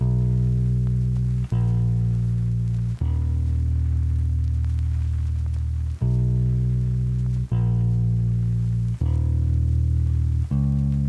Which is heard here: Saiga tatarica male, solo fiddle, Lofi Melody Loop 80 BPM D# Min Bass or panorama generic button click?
Lofi Melody Loop 80 BPM D# Min Bass